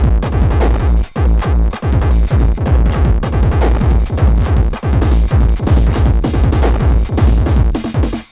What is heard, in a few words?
TH 909 160, Hard & Loud Techno